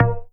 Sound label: Percs, Elektron MachineDrum